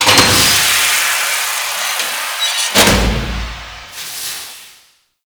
Forest Porthole Hatch; Props; Warframe; Grineer Forest

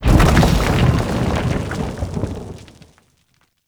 Rock Rumble; Props; Warframe; Tau; Ambience

